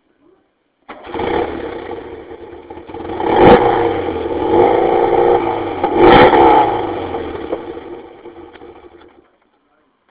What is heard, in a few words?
Flattwin